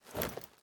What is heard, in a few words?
samurai armor; smite; god odin